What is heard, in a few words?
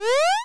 show up, square waveform; Square Waveform sfx